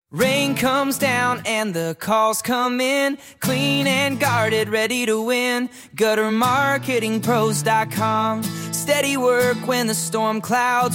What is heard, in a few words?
jingle